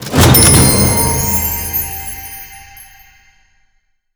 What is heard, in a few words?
UI Menu Purchase; Skylander